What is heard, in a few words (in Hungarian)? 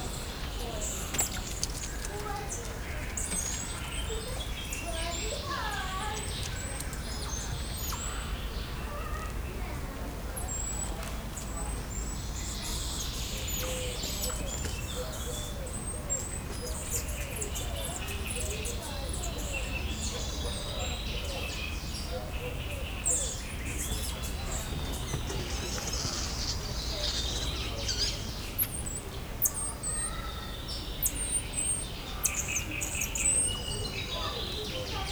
ezüstös selyemmajom; szegedi zoo 2011